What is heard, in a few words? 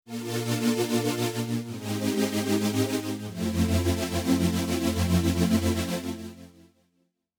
Pad Ryhthmizer